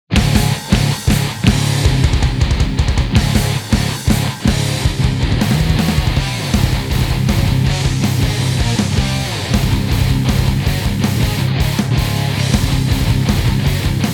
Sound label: guitars only; Game Music